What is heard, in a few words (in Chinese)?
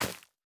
收获下界疣声